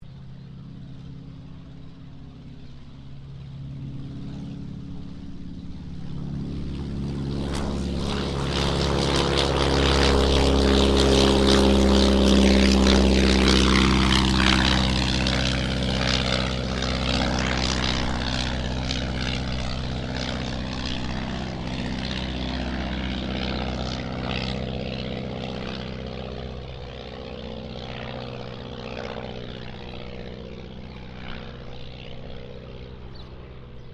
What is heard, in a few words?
AIRCRAFT DH82A DEHAVILAND TIGERMOTH PASS